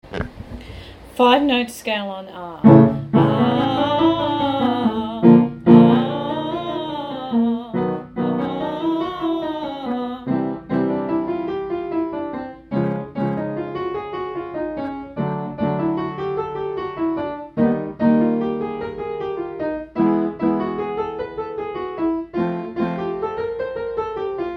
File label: 5 note scale on ah ascend descend